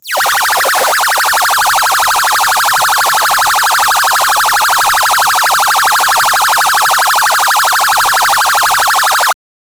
double laser fast

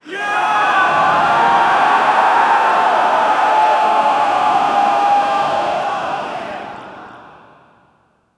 a powerful motivational, hall reverb

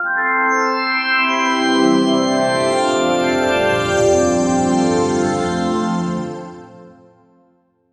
six seconds elegant logo, reveal

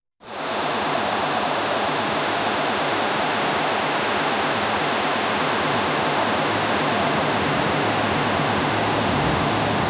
light sound of the wind

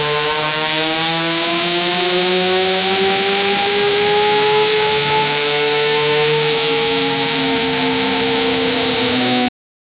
high rpm futuristic racing

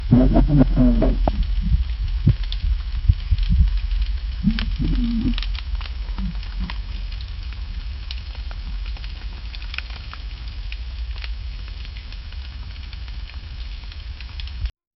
silence broken by faint, animal roar

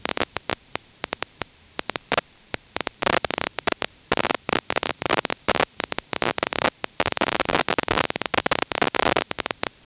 tiny static crackle

